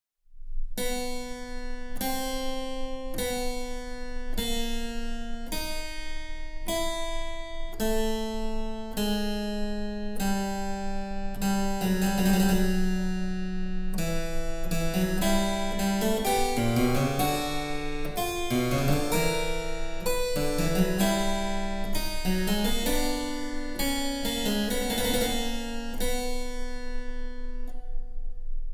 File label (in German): Cembalo; Mark Lindley WTC 1